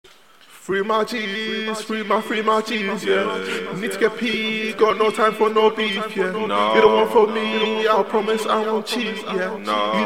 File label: trap, 153, Em, Acapellas